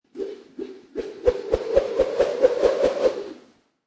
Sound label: Multiple Swish Circles, An object like a rope, cable or hose being swished; swished around repeatedly in a circular motion. Multiple swooshes; Whip; Lynne Music